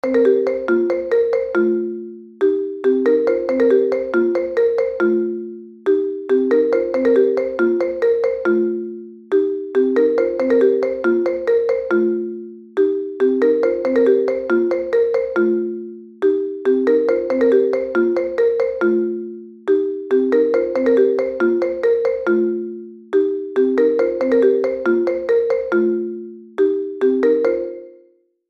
iPhone 14 Marimba; tono de llamada; Tono para tu CELULAR

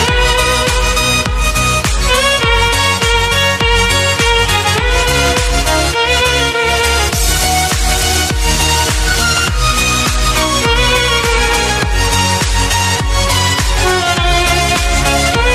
Classical Music Ringtone

